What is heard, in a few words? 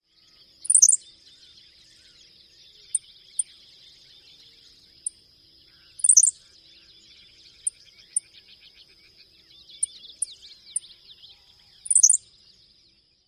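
song and chips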